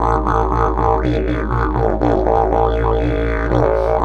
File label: 120, Didgeridoo